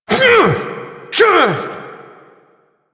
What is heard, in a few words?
Parry Finisher Vocals; Enemies; Finishers; Drifter; Warframe; Duviri; Dax